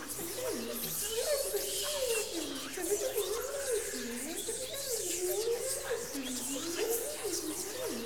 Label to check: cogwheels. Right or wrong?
Wrong